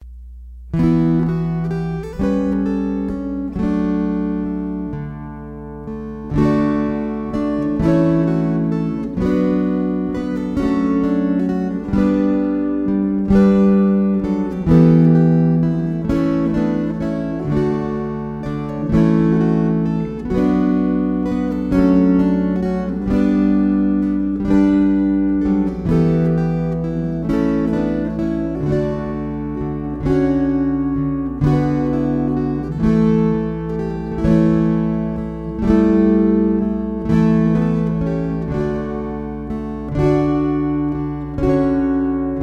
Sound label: Waltzes